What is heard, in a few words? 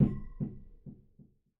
Sub Tube Hit; Game rip; MiSide